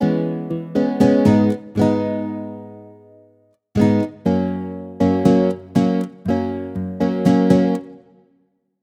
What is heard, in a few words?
Chords Rhythm Country; midi wizard